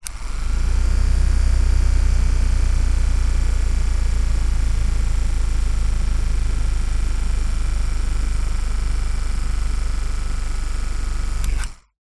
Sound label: household fan hand held plastic on run off med